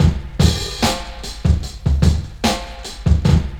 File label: BEAT, Hip Hop; E-MU Formula 4000 Series Vol. 1; Hip Hop Nation; Beats 59-88bpm